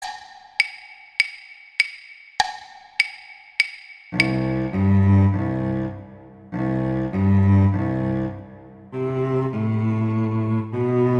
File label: Cello I; Mozart; Duets